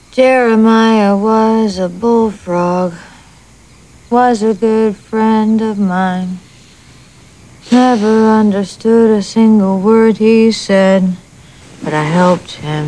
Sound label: bullfrog